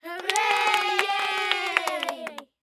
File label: cheer correct; Interactive games; Grammar Games; demonstrative adjectives